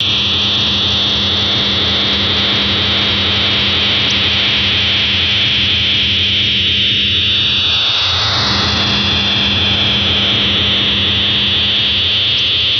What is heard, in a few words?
jammer, BW ca 8kHz; cf usb